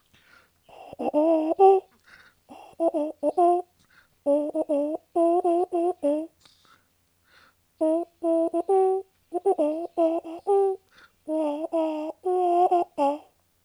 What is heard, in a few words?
chorus trumpet